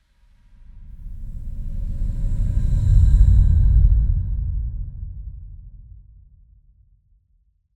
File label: Impact Sub Reversed Deep Dark Enter; Hollywood Edge; sound designer's toolkit v2